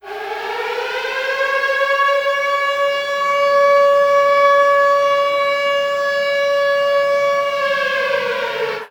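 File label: Air Raid Siren Ring The Alarm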